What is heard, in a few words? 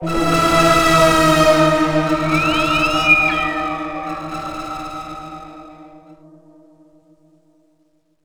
Sting; Stronghold